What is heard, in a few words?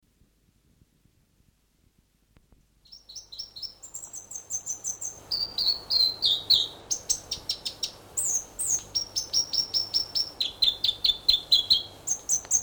north island robin song; conservation, native animals, birds, bird song